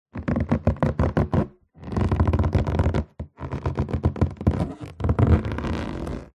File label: balloon inflated handling squeak creak; zapsplat